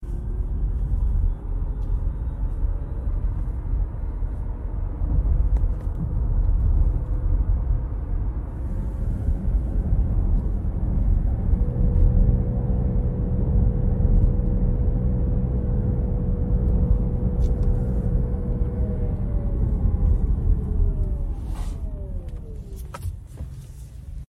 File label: Bolt EUV strange vibration; 2027 Chevy Bolt; odd noise at low power levels; Since receiving our 2027 Chevy Bolt 20 February, I’ve noticed an odd noise at low power le